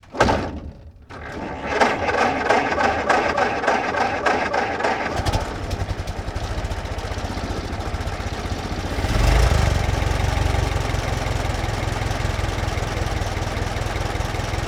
Ford Stake Bed Truck Ext Crank Start Idle Revs Off; Sony Pictures Sound Effects Series; Period Vehicles